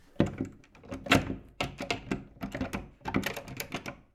Door Wood Interior Residential Bathroom Stuck Knob Rattle